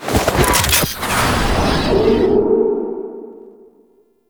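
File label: Archwing Cannon Attach; Rail Jack Archwing Canon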